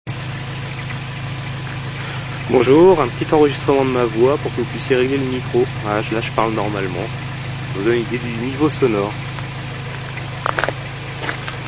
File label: ppe header race cat apexi n1 exhaust